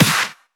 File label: snares; Vengeance Samples